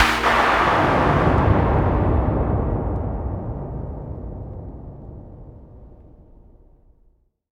POW; XXL 800 Production Effects

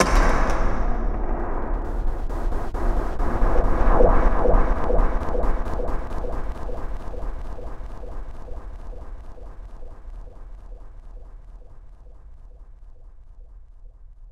Fail Upwards, Cinematic Metal Impacts

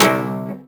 Cello Hit String Snap; SFX Kit; Foley - Misc

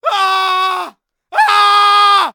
man scream; DeadFright

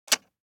DICE on Plastic, Grab, Standard, 3 Three Dice; Samples; ArticulatedSounds; DICE Sfx Party (Extended)

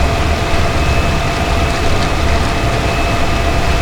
Machine Turbine Loop; Machinery - Generators

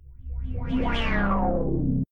scan Off; Apotheorasis

